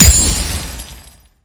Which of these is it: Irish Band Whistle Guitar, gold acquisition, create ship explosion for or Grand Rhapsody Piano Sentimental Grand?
gold acquisition